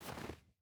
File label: footstep hide snow walk; Rust